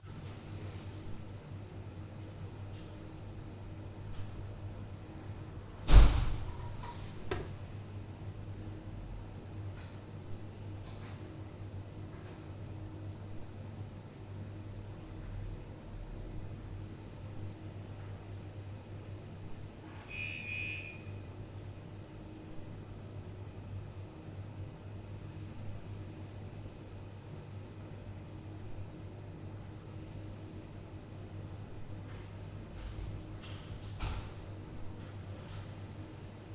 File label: MOTO + 3x DOOR NOISE, Night Noise